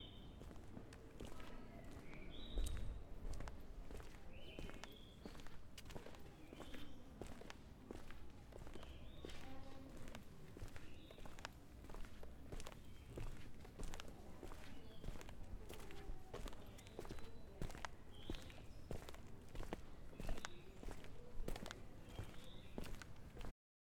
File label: Footsteps On Pavement